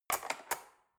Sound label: cutlery fork drawer handle scrape soft